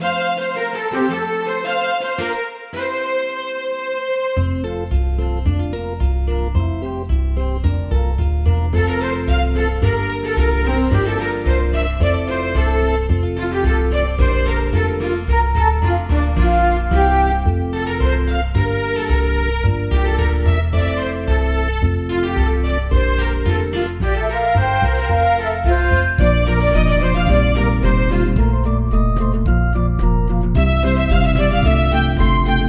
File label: Sleigh Bells; Newer Super Mario Bros Wii